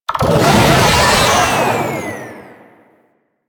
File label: Proto Infested Babau Attack, Vocals